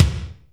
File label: Kick, Akai MPC500; Toolkits